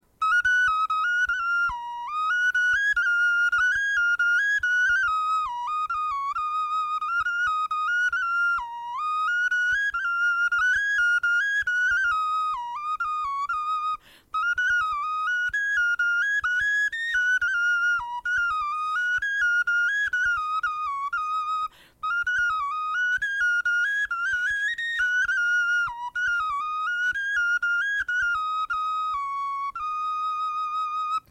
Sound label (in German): melodie